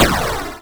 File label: explosion